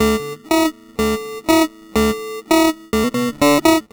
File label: Guitar Loop, 120BPM